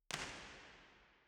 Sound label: First IR Sala 2800 Genelec Center; Santa Cecilia Roma; Measurements 2005; Soundfield